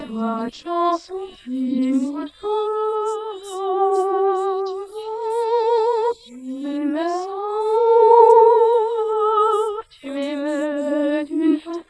singing

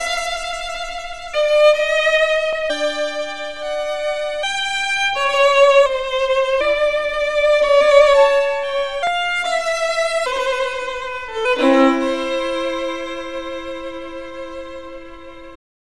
violin

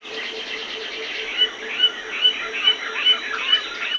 birds

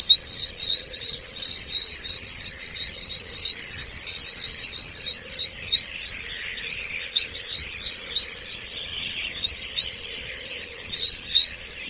birds

